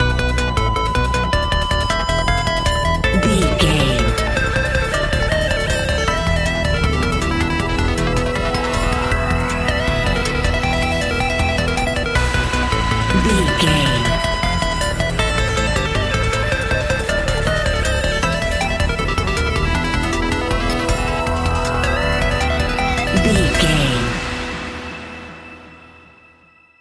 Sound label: epic video game adventure